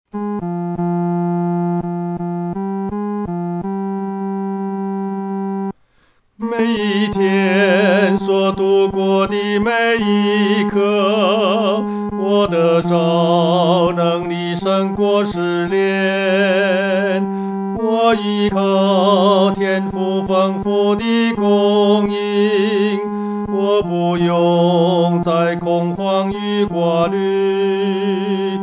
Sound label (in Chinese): 独唱 第三声